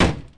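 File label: footstep car; UrbanChaos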